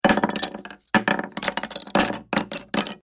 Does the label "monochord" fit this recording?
No